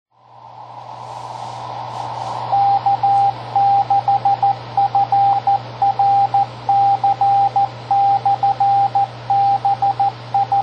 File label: beacon, 10m